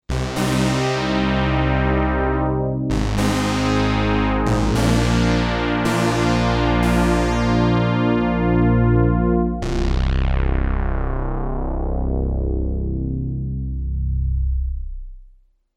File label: res detune